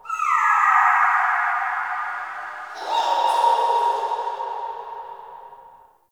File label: Root Cave Distant Chatter Two; Ambience; One Offs; Neathuns Root Cave